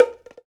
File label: TONG, African Perc; Spectrasonics; Supreme Beats; African Contemporary; Afro DRMs